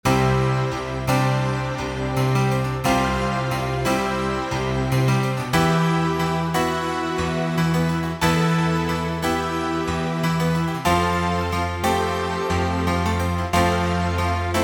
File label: pad